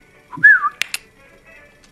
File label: Lock Chime whistle; fantastic mr fox